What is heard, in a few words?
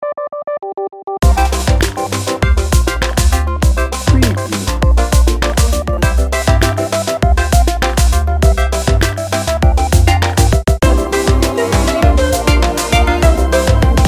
electro motion